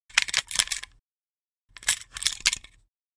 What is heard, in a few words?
Mouse trap handling and setting up